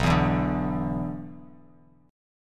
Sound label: B-Minor Double Flat 5th B down, Bass Standard